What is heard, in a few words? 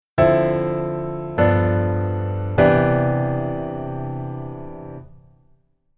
Keyboard Voicing Hi